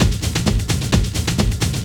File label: 130 addon, DRUMLOOPS, HIHAT